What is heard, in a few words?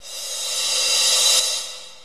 Cymbals Reverse Crash; Vengeance Samples